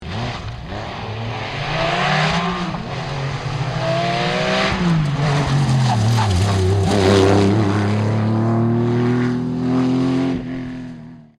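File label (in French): porsche, rallyes; Porsche Godard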